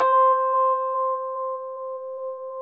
Chor Rhodes, C

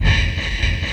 BOILER ROOM, Synth FX Misc

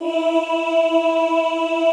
OOH E3, Classical Choir; Best Service; Extended Classical Choir

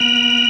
B3 C 3, ORGAN; VOCAL ORGAN; ORGAN #4

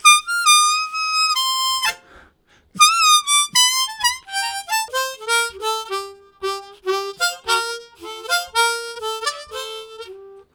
Squeeking, Harmonica Riffs; Zero G

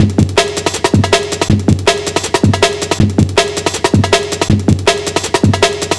160, Drum Loops; Total Drum&Bass

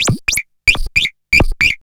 BIRDY 160, Hard & Loud Techno; USB Soundscan vol.01; AKAI

